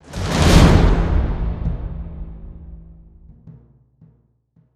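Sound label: sting, percussion; transformers war for cybertron gamerip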